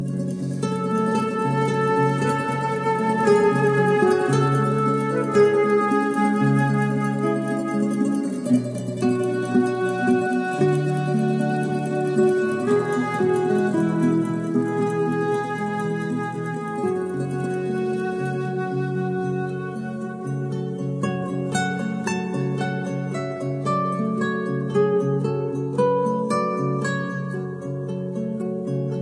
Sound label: Light Ringtone; زنگ خور ملایم آرام دلنشین زنگخور آرامش دهنده ساده شیک